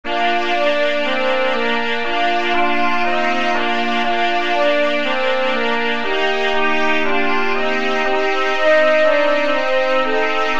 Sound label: sans trombone